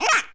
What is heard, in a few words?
toy duck, cartoon